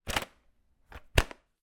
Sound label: household vhs video cassette case open then close